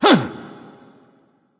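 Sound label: nonverbal surprised; smite; voicepack; ullr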